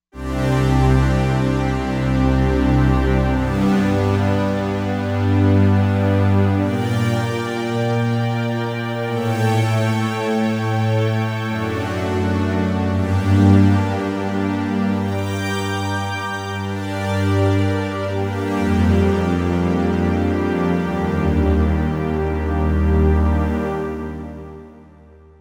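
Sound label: Pad Pulse String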